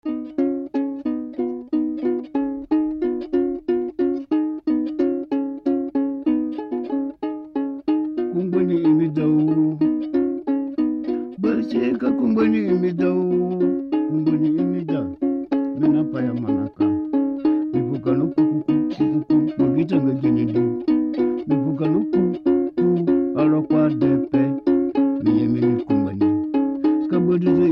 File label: canon